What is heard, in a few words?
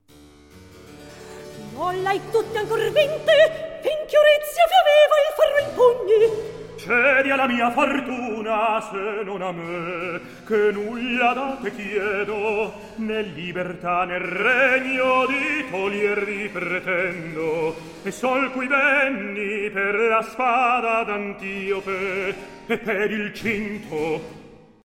Recitativo; Orizia, Ercole